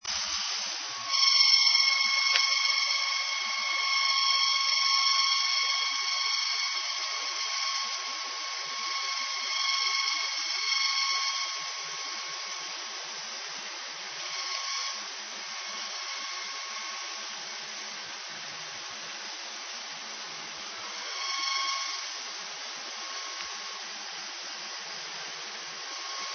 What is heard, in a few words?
reefnetter screech